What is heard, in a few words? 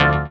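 synth bumper synth; Best Service Gigapack 1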